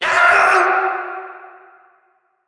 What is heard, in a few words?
scream